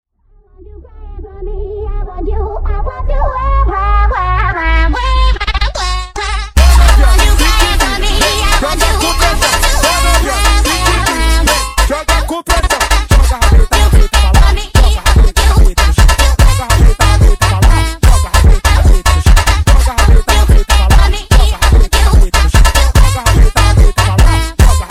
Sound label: funk sped up